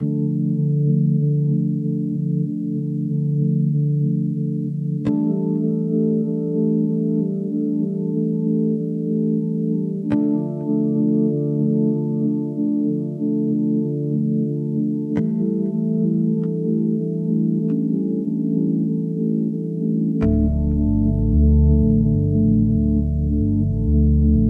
Chillout Vibes

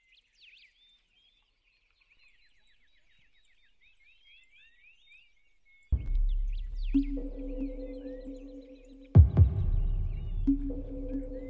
chillout nature sonar groove

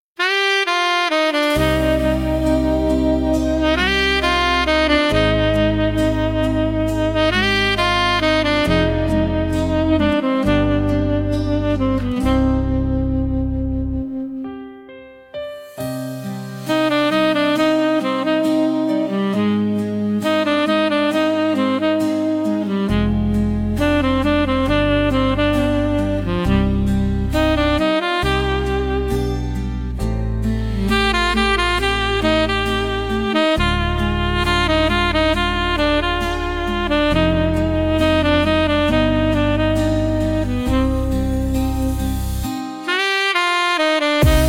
midnight sax

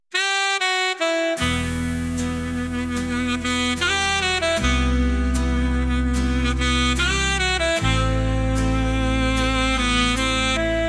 smooth sax journey

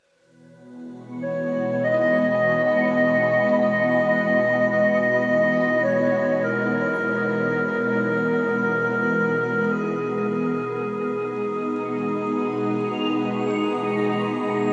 Tranquil Vibes

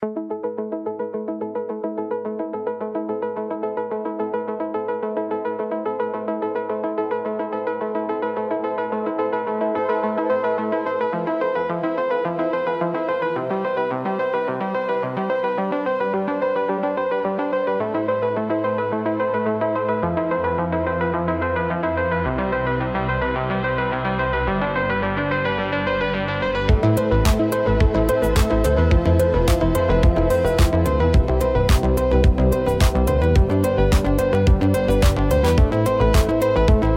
Electronic Impulse

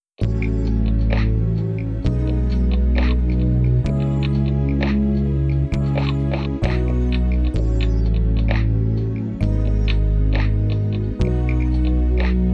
neo soul 432 Hz

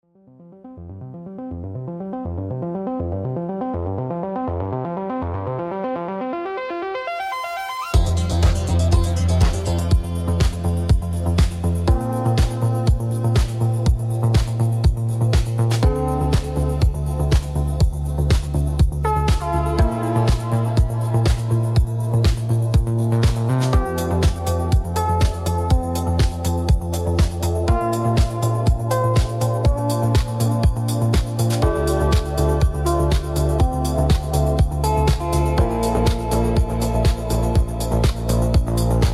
Electronic Impulse

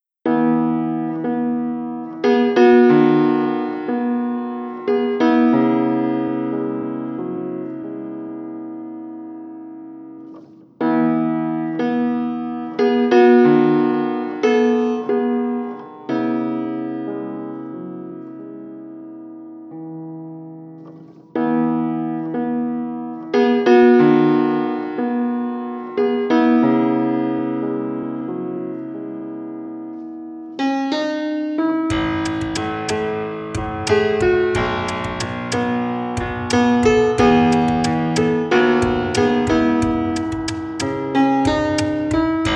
epic 432 Hz

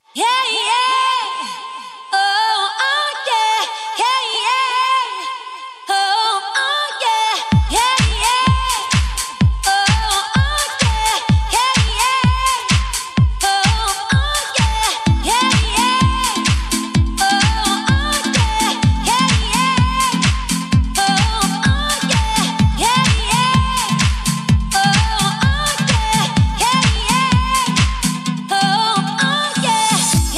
DISCO 432 HZ

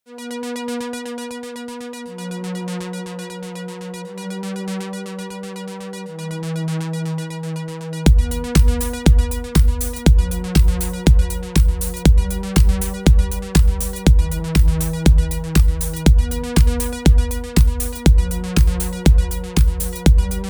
techno trip fast steep 432 hz

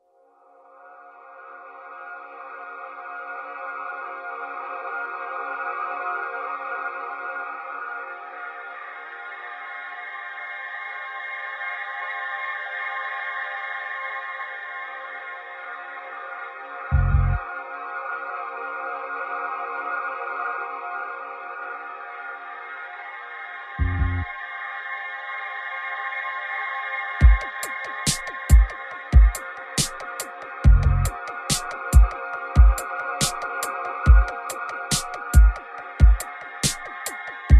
vocally 432 hz

